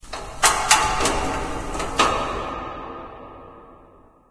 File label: mall random; ambient; Left 4 Dead 2; Source Engine; random amb sfx